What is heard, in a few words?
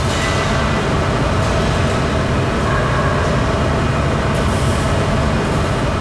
industrial; de mars facility